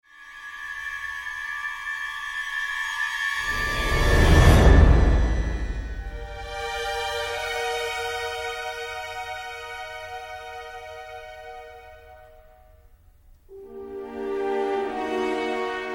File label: glassharmonica; Effets stridents dans la musique de film d'action et d'horreur